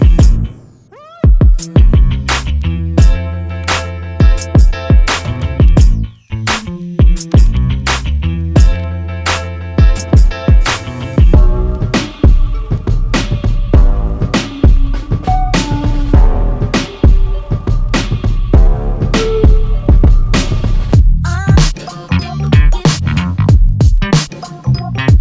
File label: drums; Devized Drums Vol.1 Demo